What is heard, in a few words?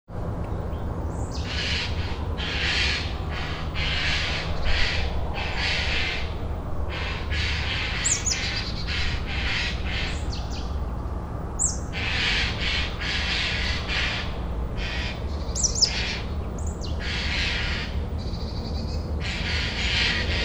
birds in city ambience